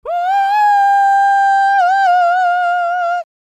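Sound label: falsetto, R&B Dance; Spectrasonics Vocal Planet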